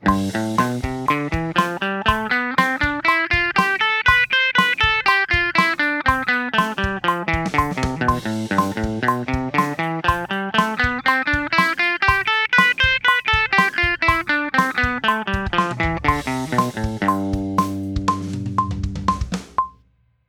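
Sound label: Am #7 Mixolydian bpm120 eighth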